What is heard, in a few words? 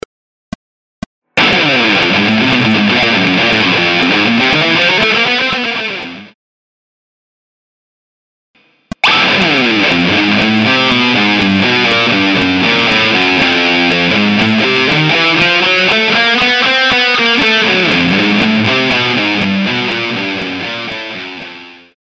chromatic riffs